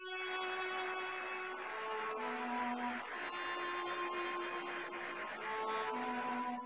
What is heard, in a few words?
Castanets Violin Applause